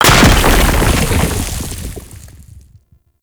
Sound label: Octopede Rock Impact; Warframe; Man In The Wall